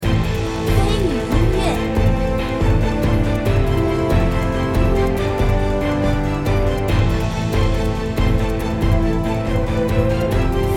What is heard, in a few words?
battleready